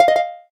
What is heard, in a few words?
gayageum, keytone